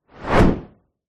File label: whoosh fast; sound design